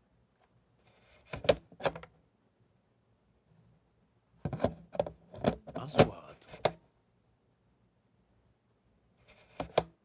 صوت تركيب عدسات التصوير; shooting

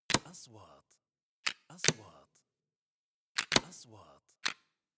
نغمة كليك; transitions

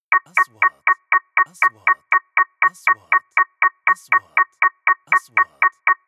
نغمة تنبيه تنازلي; transitions

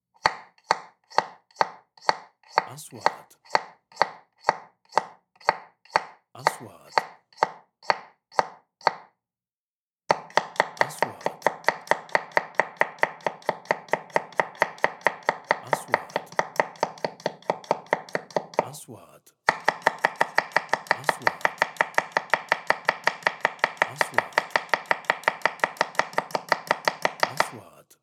صوت تقطيع خضروات فاكهة; sound effect

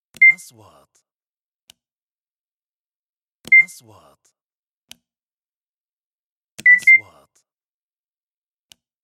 نغمة تنبيه خطأ; transitions

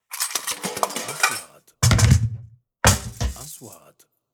خزنة معدنية; sound effect

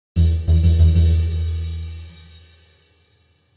إنتقالة إفتتاحية

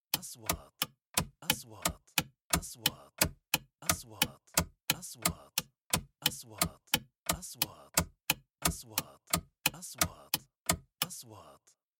نغمة إشارة سيارة; transitions

